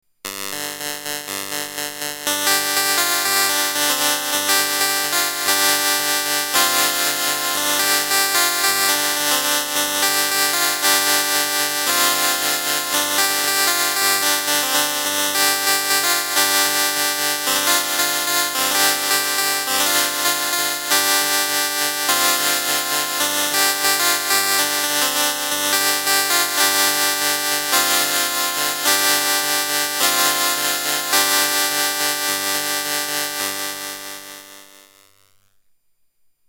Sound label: spinet